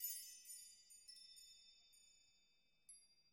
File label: chime jangle; angband